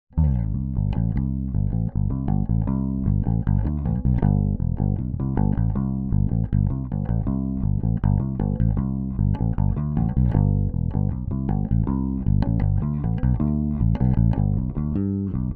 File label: bass